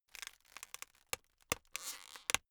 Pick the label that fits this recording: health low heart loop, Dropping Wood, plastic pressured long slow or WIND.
plastic pressured long slow